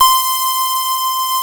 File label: FUZZ LEAD C5, PPG, analog; USB Soundscan vol.49 - Analog Fantasies; AKAI